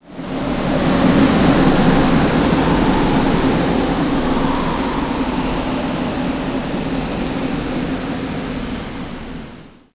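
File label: wind hill, ambient savanna; World of Tanks Blitz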